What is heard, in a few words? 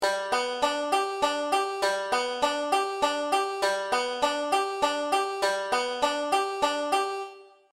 3 4 straight time, banjo; beginning 5 string